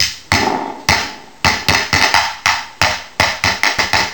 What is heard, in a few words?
Boss HC-2